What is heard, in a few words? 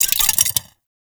Metallic Move; Enemies; Sentient; Sentient Movement